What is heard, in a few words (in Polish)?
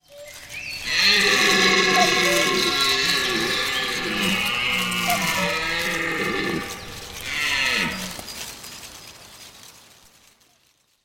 jelen